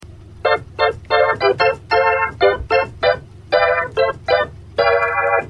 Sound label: rotary